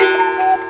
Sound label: CUCKOO; Alpha CD-ROM Bonus Pack